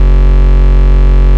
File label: Ultimate Bass Root F#; Vengeance Samples